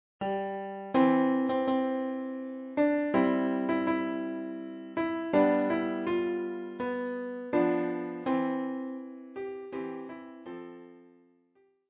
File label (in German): O Tannenbaum